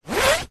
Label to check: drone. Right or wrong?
Wrong